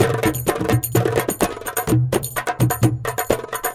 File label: Latin House Loop 128 BPM; Vengeance Samples